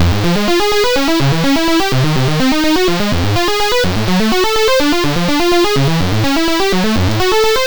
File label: Sine Weirdness Fm 125, Synth Loops, 125bpm; Zero-G; Soundclash; Nu Dance Electro